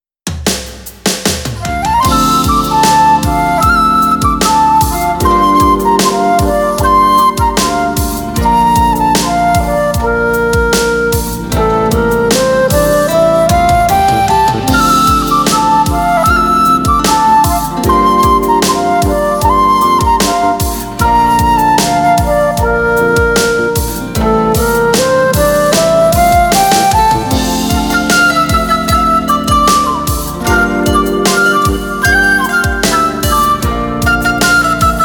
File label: Flute With Drum